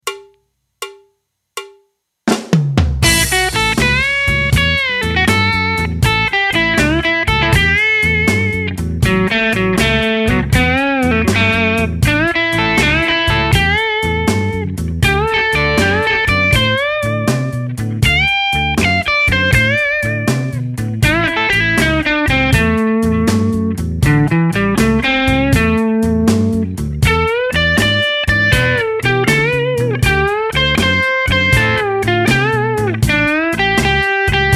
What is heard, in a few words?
blues